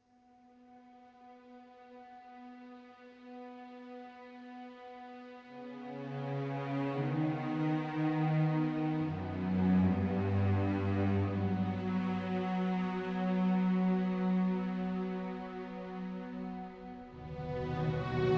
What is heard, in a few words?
84bpmvar 4-4; Planet of Lana